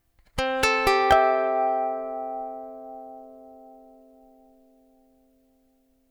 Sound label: Text Tone C6 Harmonics, ukulele